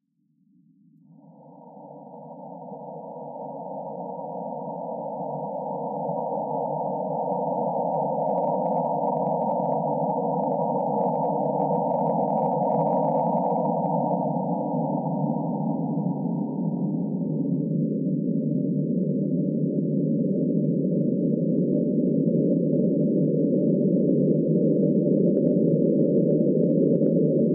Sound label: surf thread noise